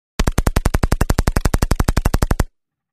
ochered iz vintovki s glushitelem

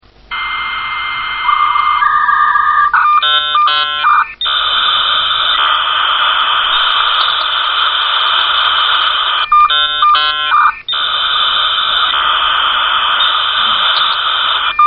zvuk konekta modema

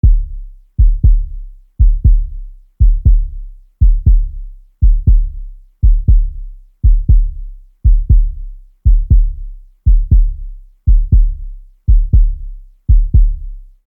Bienie serdca - Zvuk bieniya serdca

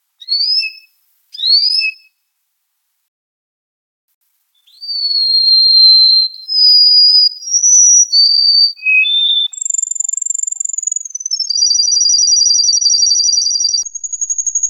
penie kanarejki; 3D звуки

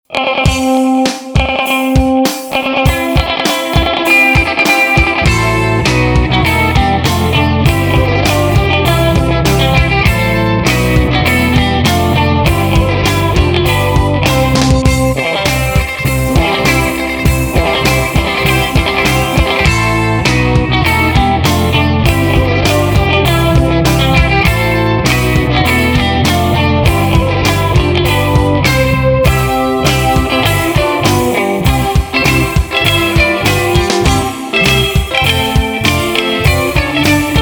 marsh mendelsona na elektrogitare

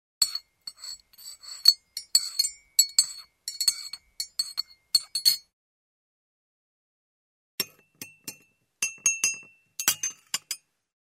razmeshivanie lozhkoj kofe

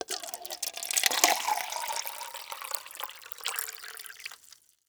nalivaem vodu v chashku

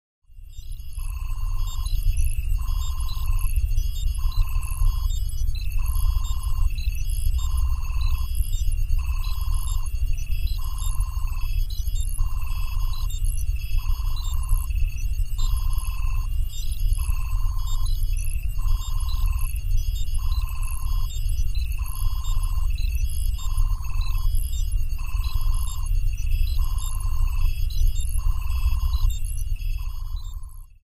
zvuki priborov na kosmolete

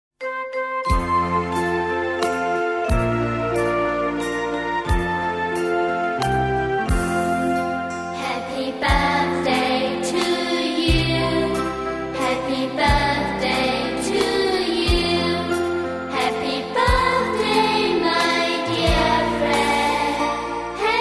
happy birthday detskij hor

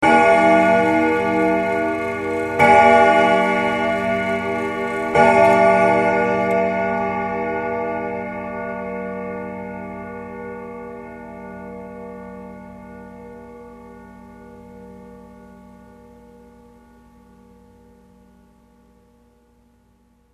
boj starinnyh chasov

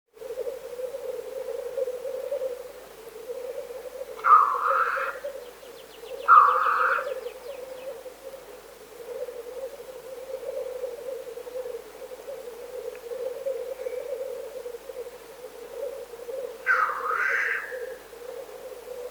zvuk uhanja tetereva

